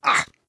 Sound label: Wound; Valanar